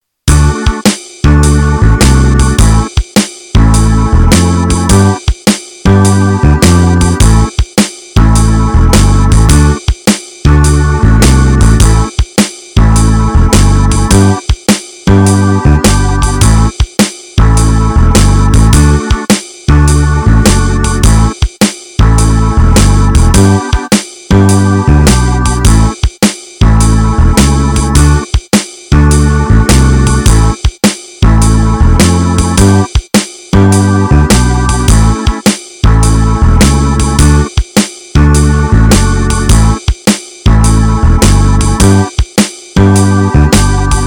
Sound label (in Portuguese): eu canto instrumental